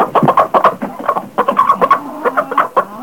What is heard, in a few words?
hen